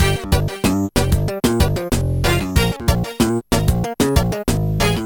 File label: ragga menu music; Trackers; Modland